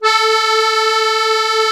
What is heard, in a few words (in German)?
MUSETTE A1, akkordeon; Best Service; Alpine Volksmusik